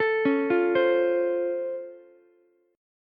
C sharp Augmented Major 7th C sharp down Ukulele, chord; C#+M7; arpeggiated; JGuitar